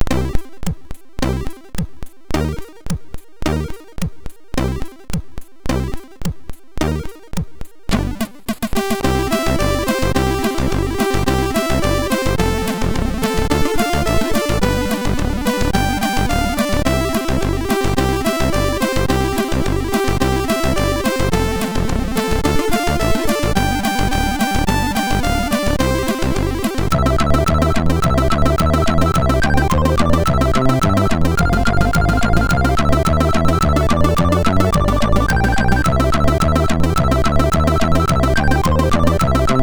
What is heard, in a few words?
Jingle Bells, MOS6581R2; hvsc